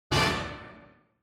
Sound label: Quiz Question Low